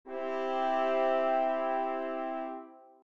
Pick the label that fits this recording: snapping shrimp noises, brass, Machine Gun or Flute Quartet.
brass